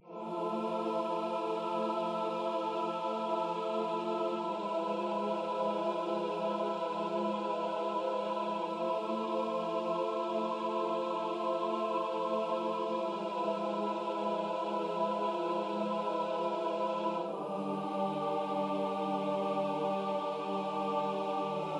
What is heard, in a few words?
Temple Layer Choir; Tintin Reporter: Cigars of the Pharaoh